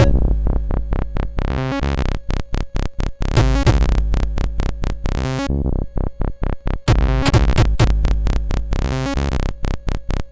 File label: sid MOS6581; Lauter